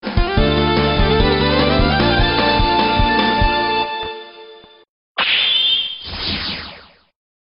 Win; Megaman X5

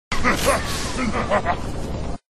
laughing

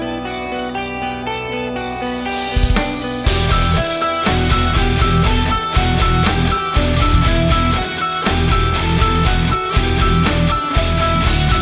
Metal Cover

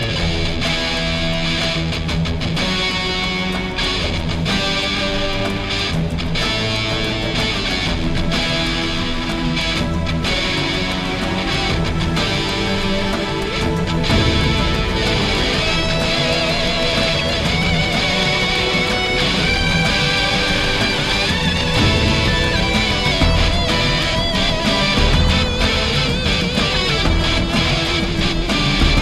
electric guitar; halo

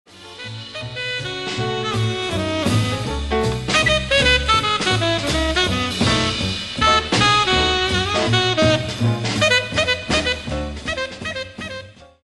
Solo

